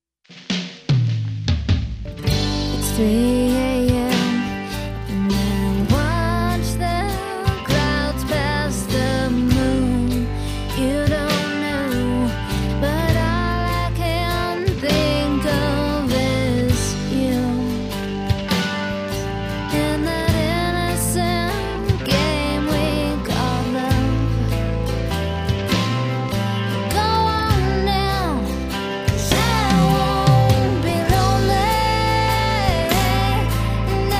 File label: Rock Track